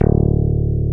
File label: BASSES, Roland